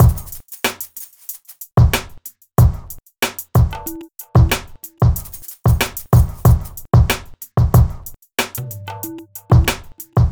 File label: Drums, Hip Hop